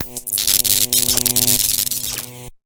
battery

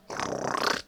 gator question

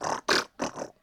gator

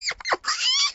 mouse med howl

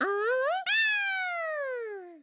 koala long howl

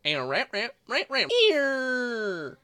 beaver long howl

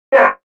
prethink grunt

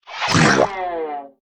throw wedding cake miss

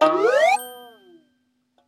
cannon growth

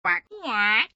duck med howl

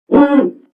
grunt

